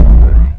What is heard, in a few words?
health; lmctf